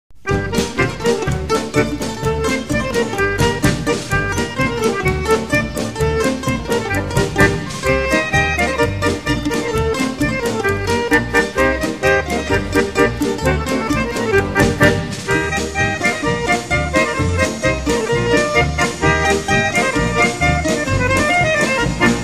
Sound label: lezginka